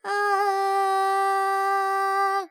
Panthuvarali, Carnatic Female, alaps, vocals, 100 G, dry; Sample Banks